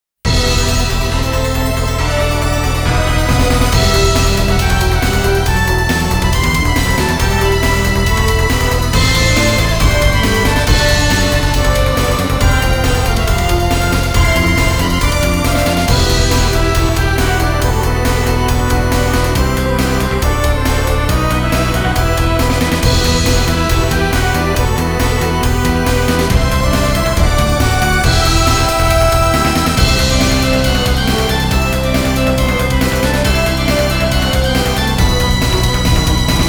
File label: rhythm ver.